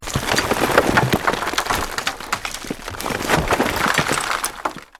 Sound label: ore pour